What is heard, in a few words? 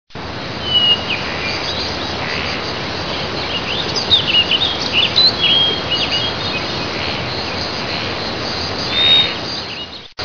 wind; ambient